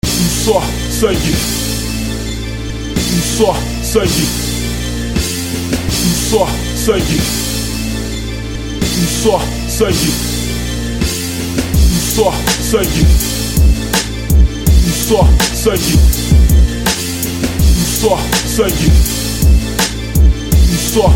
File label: beat